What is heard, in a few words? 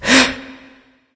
nv breath; smite; awilix